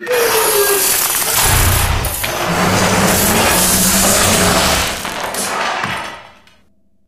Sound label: gun reveal